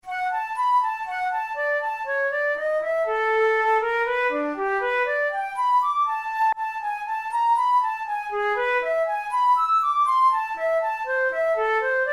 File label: study in D major; kohler op93 no2 study no1